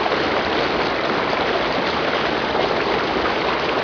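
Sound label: waterfalls near loop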